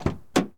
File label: Mustang Door Open; Automobiles